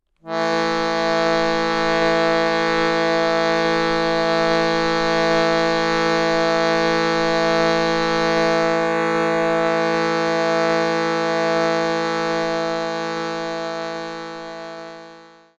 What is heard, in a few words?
Shruti Drone E A